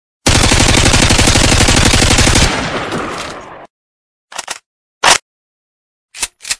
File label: m4a1